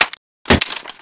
clip in, G21C; weapons, opx

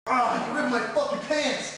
hurt heavy; billy

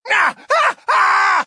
death; joker

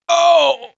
death; cj

